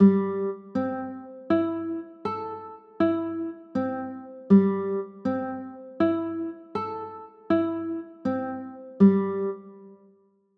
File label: c 6, chords